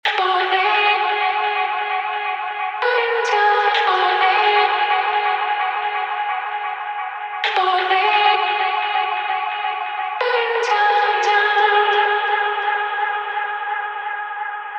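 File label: 130 Echo Vocal Loop G minor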